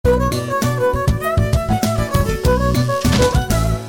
Romantic Violin Sms

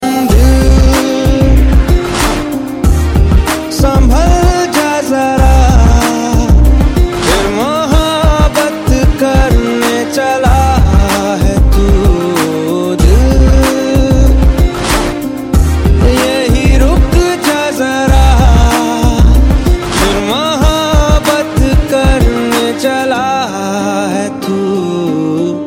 hiphop

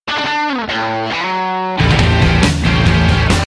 guitar intro; FLCL

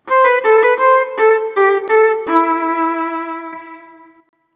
Christmas Violin

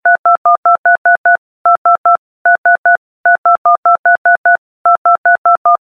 Phone Song

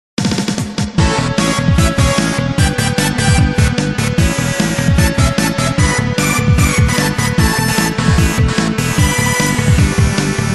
Early game battle

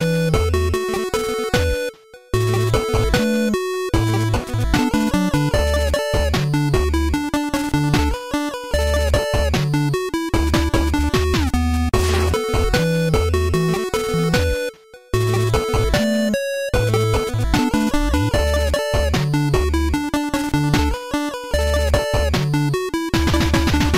8-bit

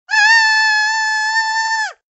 scream; Hog Rider

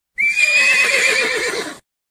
Horse Neighing

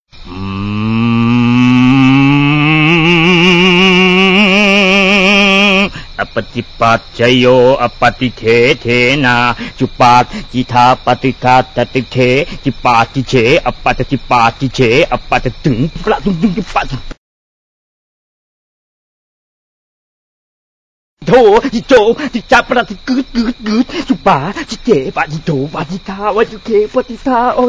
Thai Praying

